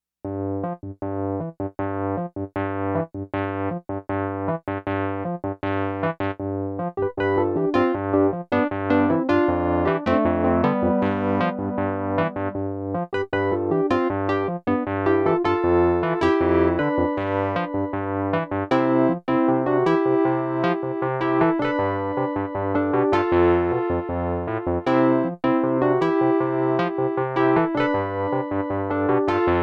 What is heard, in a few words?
awesome rhodes